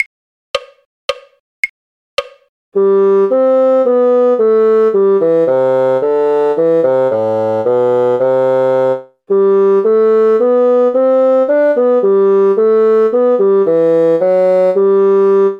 bassoon; sweet polly oliver; folk

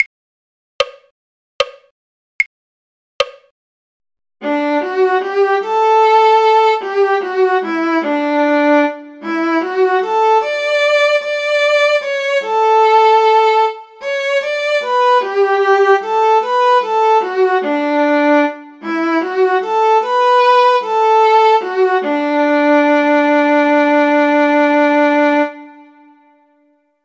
viola; Barbara Allen; folk

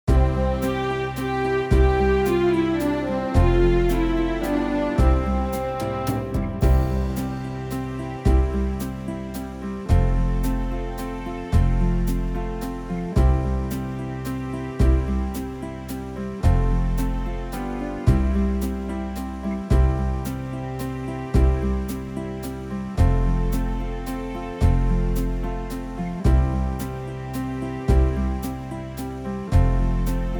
backing, folk; bassoon; lavenders blue

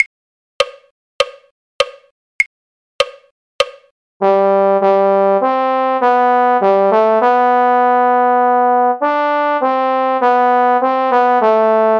trombone; carols

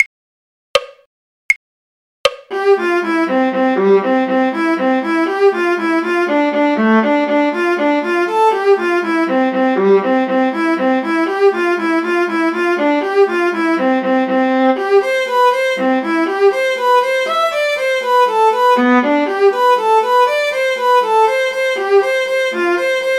viola; The Irish Washerwoman; folk

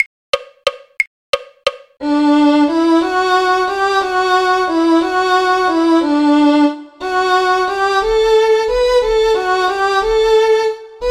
violin; carols

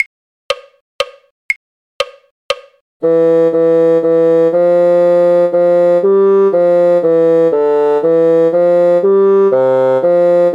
bassoon; drink to me only with thine eyes; folk

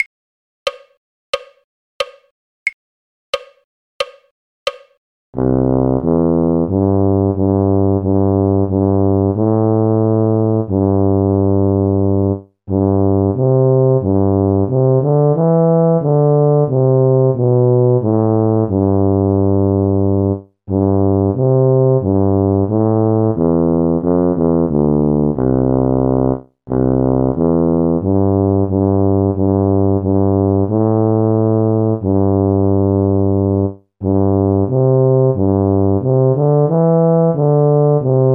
tuba; carols; wake o wake with tidings thrilling

